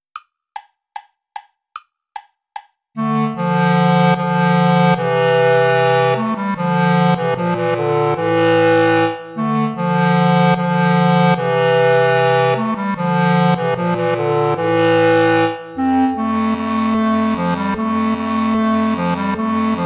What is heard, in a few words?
bourree play along without clarinet; praetorius michael